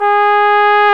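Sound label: brass, Trombone; Roland L-CDX-03 Disk 2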